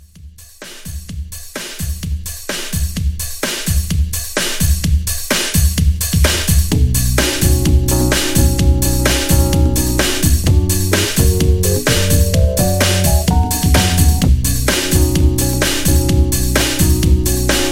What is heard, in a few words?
70s Classic Soul Re Drum